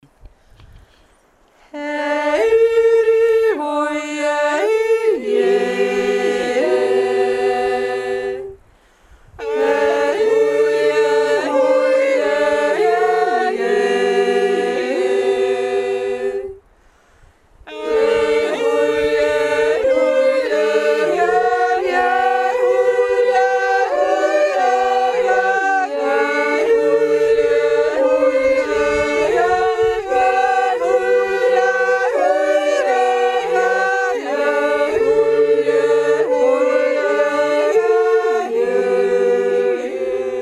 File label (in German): zweistimmig; dr wurzhorner